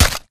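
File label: impact bullet generic headshot human; Titanfall 2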